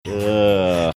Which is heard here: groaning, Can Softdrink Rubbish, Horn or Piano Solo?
groaning